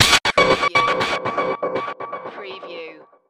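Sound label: Production Element Chopper; Sound Effects Bible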